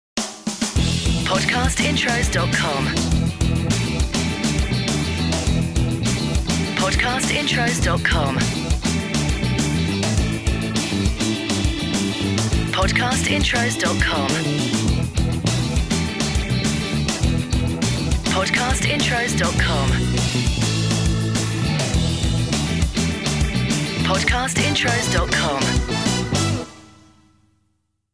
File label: Podcast Watermark FUN Chat Show